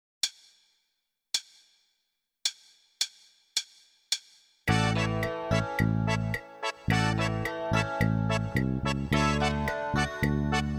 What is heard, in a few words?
Pattern Rock In 1/8